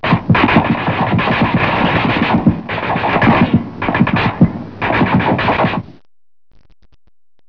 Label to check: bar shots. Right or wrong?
Right